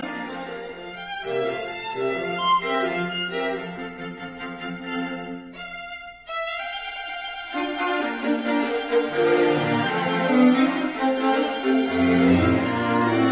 String Quartet No 17 in B flat major Allegro vivace assai; Mozart; K 458; Hunt